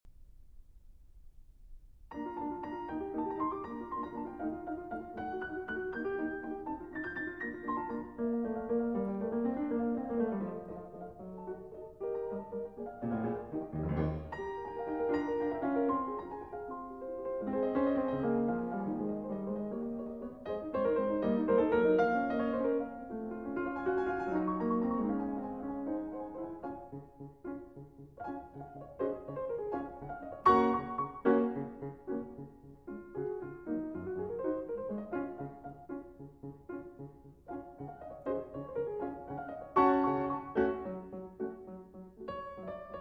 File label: Piano Sonata No. 21 in B-Flat Major; D. 960; Schubert